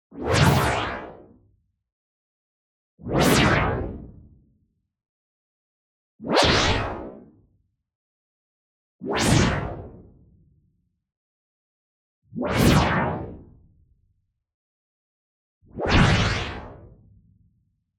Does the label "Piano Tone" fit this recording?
No